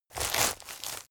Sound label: step forest walk